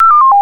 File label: Hangup, Signalling Tones Elevator Phone